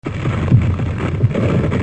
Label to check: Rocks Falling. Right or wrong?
Right